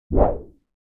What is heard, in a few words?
Woosh Low Short